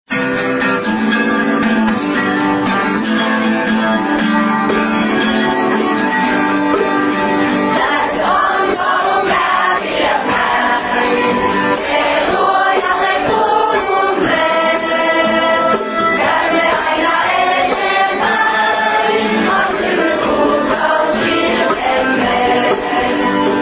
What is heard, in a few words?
Pathfinder song Finnish